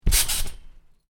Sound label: Trampoline, bounce on: light bounce on canvas trampoline; Miscellaneous Sport Sound Effects; Stuart Duffield